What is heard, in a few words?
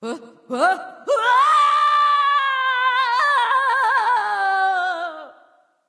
fear; smite; voicepack; nike